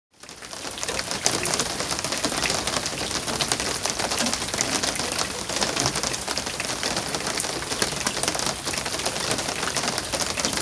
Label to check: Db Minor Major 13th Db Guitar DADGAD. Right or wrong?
Wrong